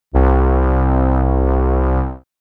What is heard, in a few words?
Wide, synthlead